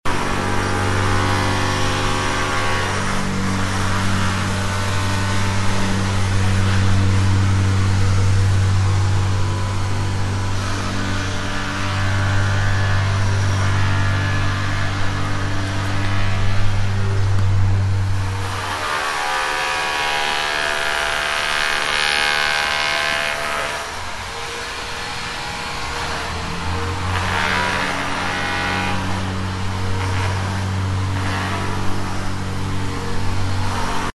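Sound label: construction and repair sounds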